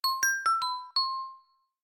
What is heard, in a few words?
Elegant Bell, notifications; Infinix; XOS 15